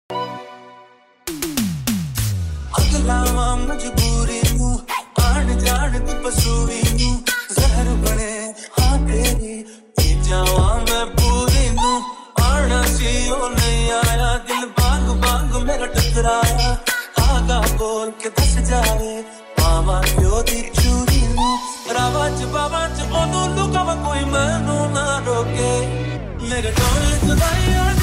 remix ringtone

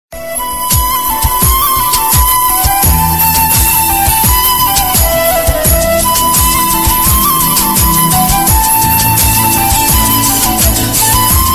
romantic tone ringtone

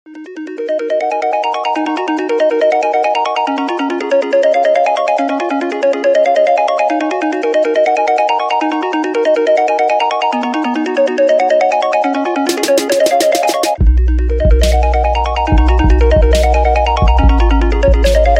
trap remix ringtone; ios 7

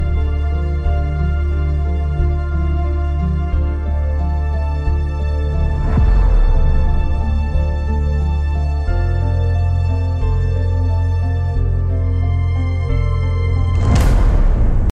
trailer music ringtone, bollywood; sherni

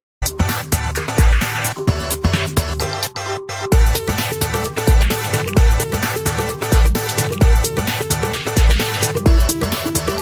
Nokia remix music ringtone

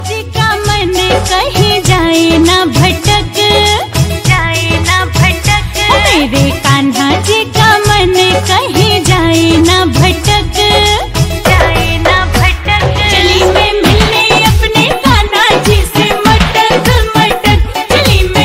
cartoon animation dance song ringtone

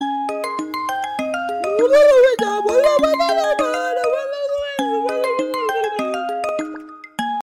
phone ringtone; lenovo

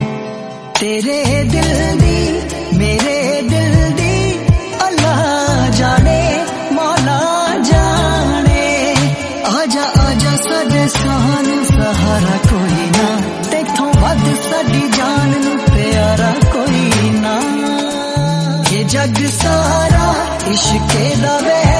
hindi ringtone, bollywood ringtones

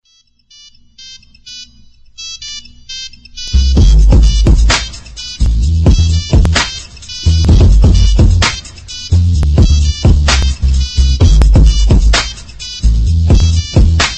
nokia tune, ringtone

